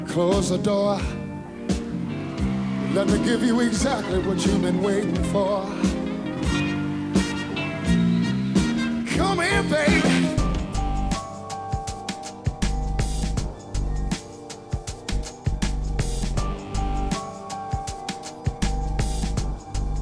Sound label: music; UNSUNG; Unsung 3B